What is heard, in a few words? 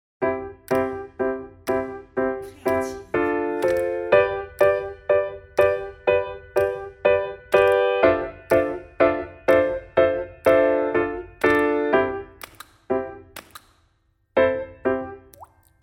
piano